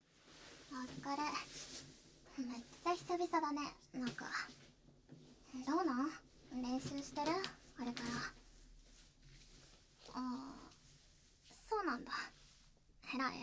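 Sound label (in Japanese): キス／耳舐め; 即おち！; 手マン／わんわん／オナニー／性感フィードバック／騎乗位／絶頂／潮吹き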